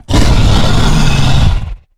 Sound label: Exertion Heavy; Evolve; Goliath